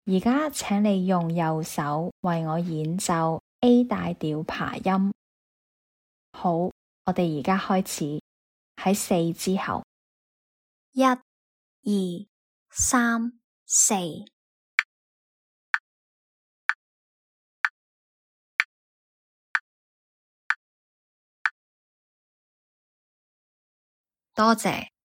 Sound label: a maj arp rh